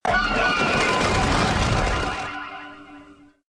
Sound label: Bats